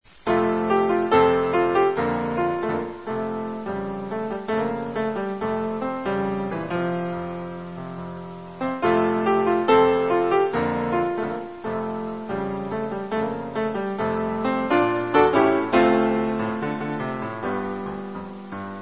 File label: piano, solo piano